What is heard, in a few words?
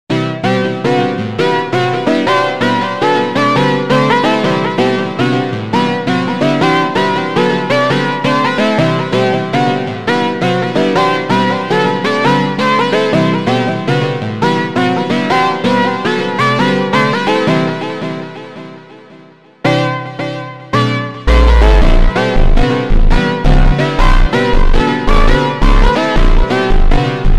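instrumental Super Slowed, phonk music; funk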